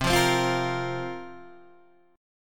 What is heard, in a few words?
C 7th Suspended 4th, Guitar, DADGAD